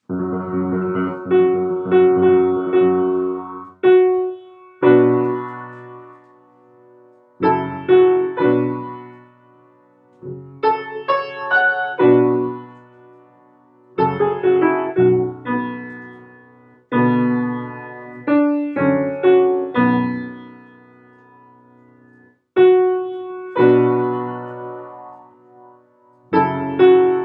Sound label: instrumental